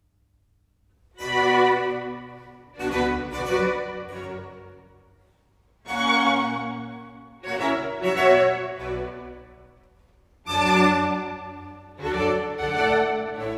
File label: Concerto Grosso in B minor, Largo; Handel Masterworks; Klassika